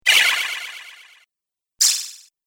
Magic Spells; Magic Sound Effects

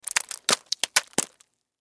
Splat Hit Juicy, Splat Hit Juicy Impact Multiple; Impact Splat Wet Juicy Hit; Splats; Foley; Blastwave

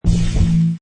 Hi Tech Panel Servo Button

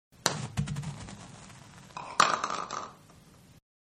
Golf Putt into hole; Sports; Manhattan Production Music

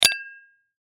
Glass Clink Empty Bottle Toast; Dishware Sound Effects; Household; Foley; Blastwave

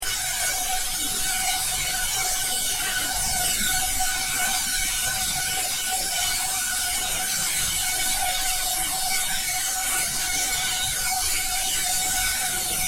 Sci-Fi Noise Stream Loop Friendly; Science Fiction Sound Effects; Andrew Fleming

